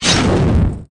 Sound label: gongji; meisujiaer game